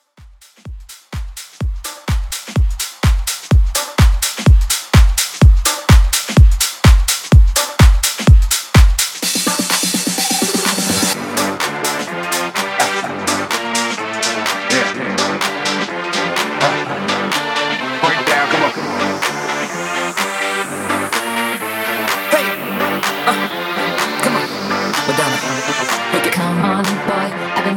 Remix 126BPM; Botleg Remix; remixplanet

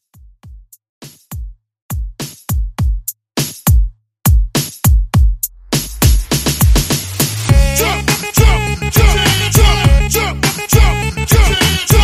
Hype Redrum 102 Bpm

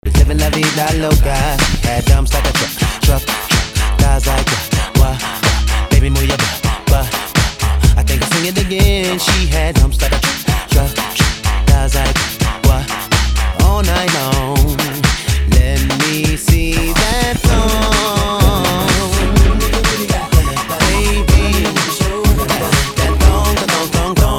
Classic Party ReDrum

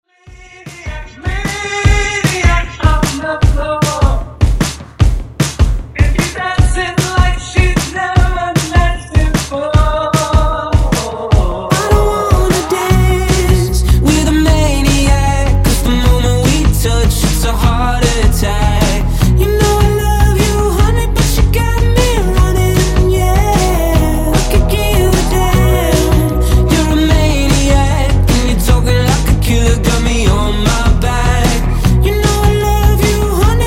Hook In Out and Acapella 152 Bpm

